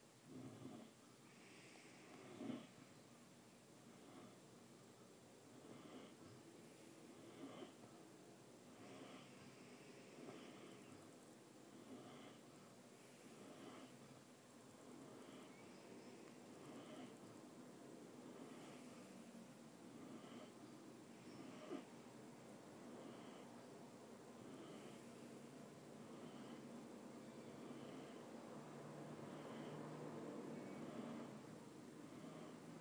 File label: sleeping dog